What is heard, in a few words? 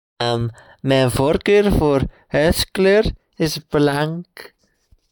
farting